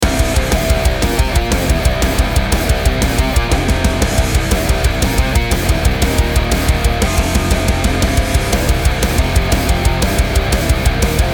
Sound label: metal blues 120 bpm short